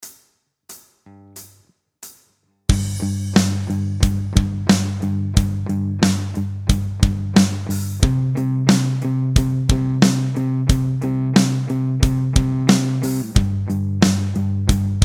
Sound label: Backing Track G C; guitar scale